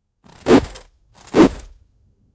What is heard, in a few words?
bear animal jumping